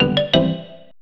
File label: Completed